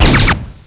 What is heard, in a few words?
wizard attack; Quake